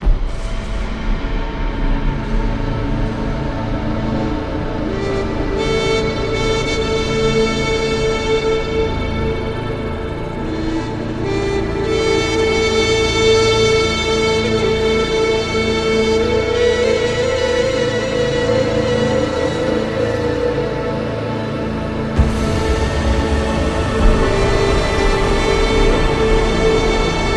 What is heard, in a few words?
Islamic Tradition; ramadan 2025